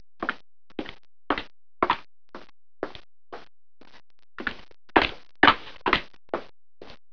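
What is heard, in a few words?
footsteps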